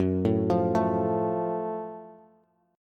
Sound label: Gb Minor Major 7th Gb Bass; arpeggiated; JGuitar